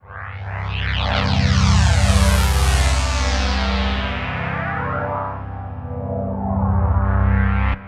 Ana Phase, Synth; Akai; Mule

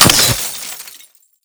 kiosk destroyed, collisions; FEAR

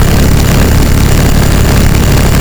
fire loop, guns vulcan; FEAR

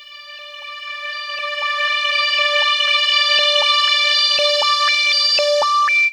Melody, Akai Pads; Mule

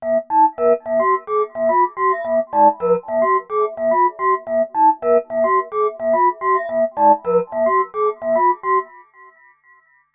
Melody, Akai Pads; Mule

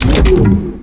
chopper door open

